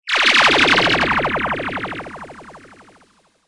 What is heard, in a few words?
shrink Ray; Food Farmer